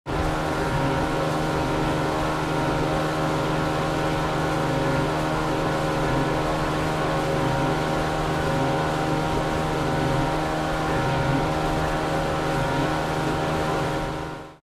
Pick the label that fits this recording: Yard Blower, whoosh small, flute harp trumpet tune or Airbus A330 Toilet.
Yard Blower